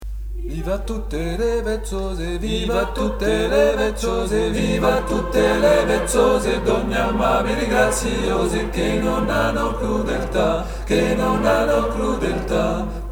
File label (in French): Tutti avec choeur; Viva tutte